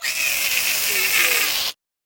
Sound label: Critter